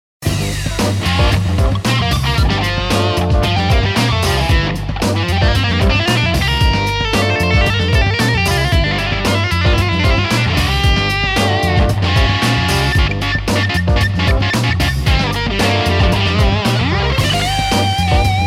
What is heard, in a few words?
Solo